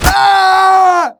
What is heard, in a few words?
death, player; Team Fortress 2